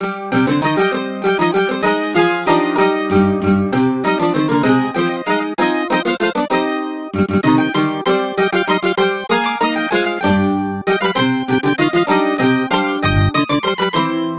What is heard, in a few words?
Mandolin; The Bard's Tale Remastered Trilogy